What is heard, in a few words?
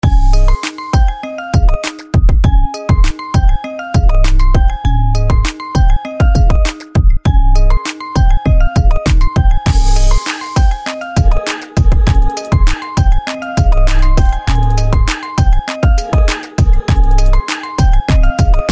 Funny Remix; Levono